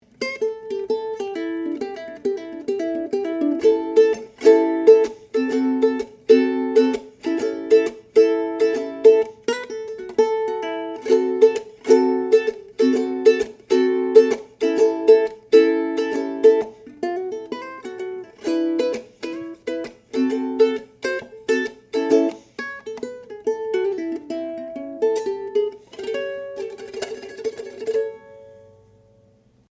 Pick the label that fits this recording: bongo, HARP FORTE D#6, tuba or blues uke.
blues uke